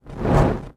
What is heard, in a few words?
Torch Movement; torches